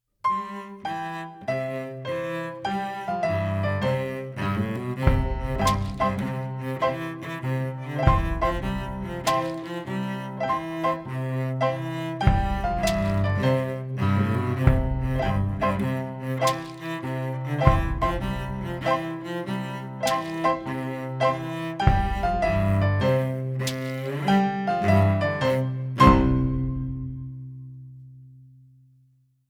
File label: instrumental